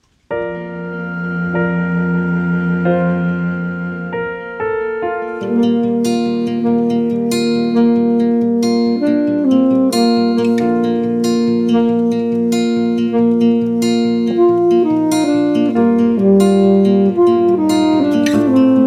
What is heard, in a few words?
sax